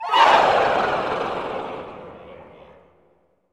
CHO FALL, CHO Falls; Sound & Vision - Gigapack I CD 2 (Roland)

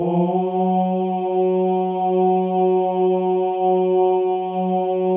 male ohs; Spectrasonics; Symphony of Voices

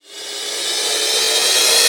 Reverse Cym, Cymbals

